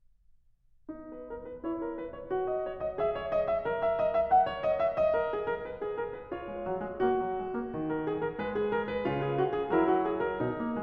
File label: Prelude No. 23 in B major, Well-Tempered Clavier; BWV 868; Bach; Book 1